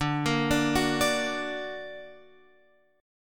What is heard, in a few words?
D Augmented D down Guitar Open F; D+ arpeggiated; JGuitar